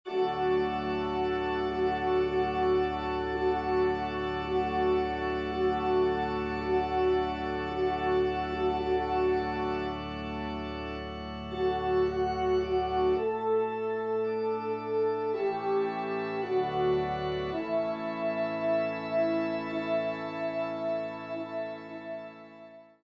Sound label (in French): Trp Org; messe cantin